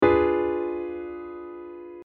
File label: D dim